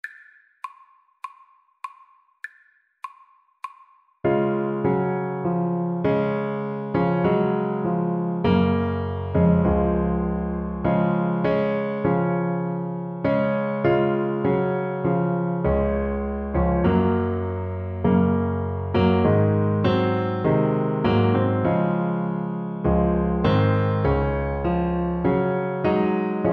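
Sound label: girl left alto saxophone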